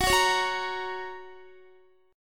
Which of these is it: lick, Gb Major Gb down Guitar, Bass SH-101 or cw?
Gb Major Gb down Guitar